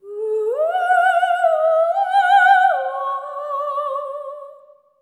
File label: LEGATO, Symphony of Voices; Spectrasonics